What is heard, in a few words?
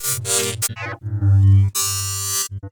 synth sawtooth; pooper; soundmorph